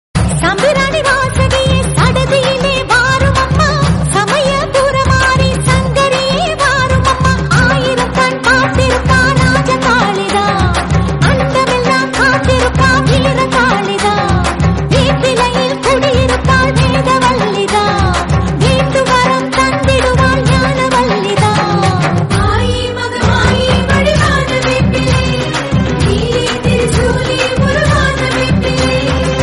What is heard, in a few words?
tamil; palayathu amman